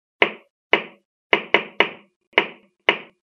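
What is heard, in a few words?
knocking sound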